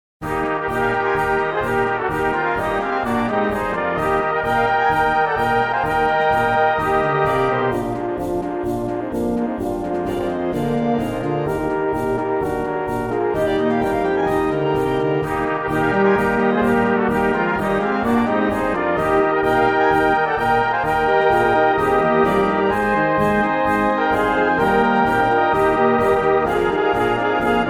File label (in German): Polka